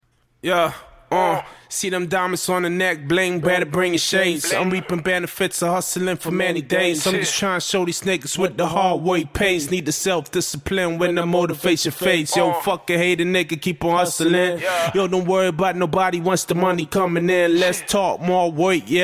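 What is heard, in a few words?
hip hop 182 F minor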